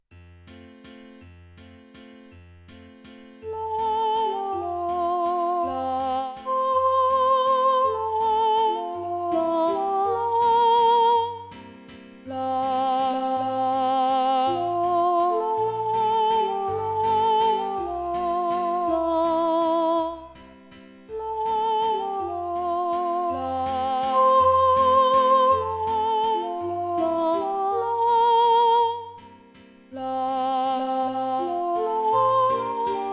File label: hebrew slaves alto, choir